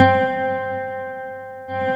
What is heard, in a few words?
C4, SynthLine; 90 s Sample CDs; NiceBeats; SynthLine VOL-33